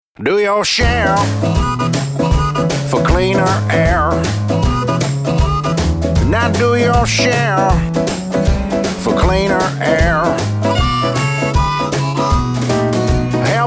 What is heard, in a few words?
Jingle